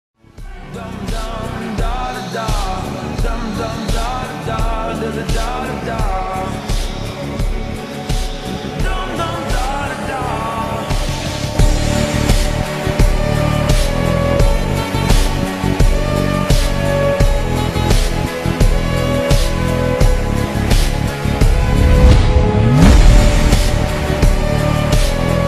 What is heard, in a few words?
instrumental